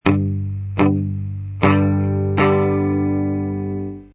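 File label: palm mute, guitar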